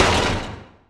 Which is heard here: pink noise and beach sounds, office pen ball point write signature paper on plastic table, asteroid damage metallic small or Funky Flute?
asteroid damage metallic small